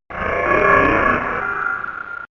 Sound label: hurt; cof